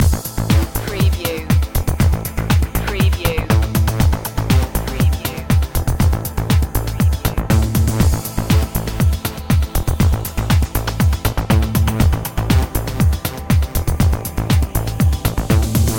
neutron groove loop

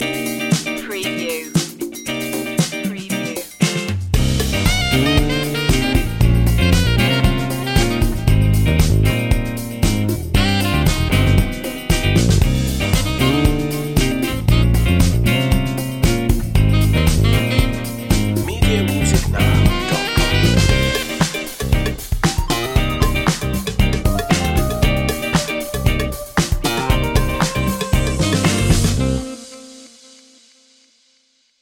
soul fusion

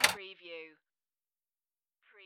Pinball sound, small metal drops

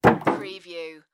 Gas Can Buckle Metal

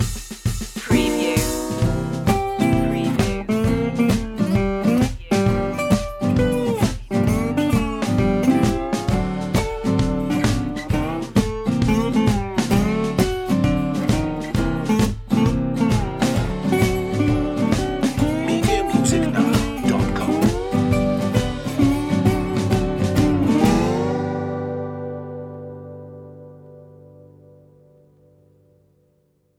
blues

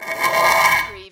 Button Sound - Metal Scrape Long

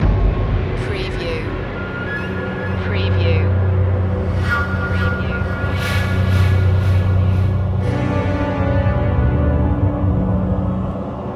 epic battle

